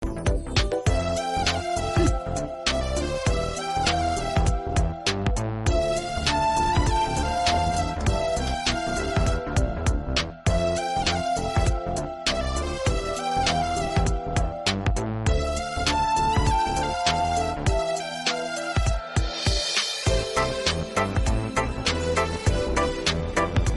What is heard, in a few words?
love bgm